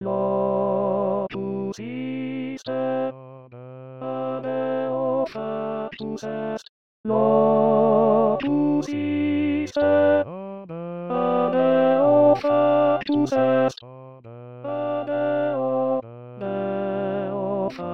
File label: Bruckner Locus iste tenor